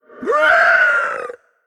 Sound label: Undead Quillboar, Emote Death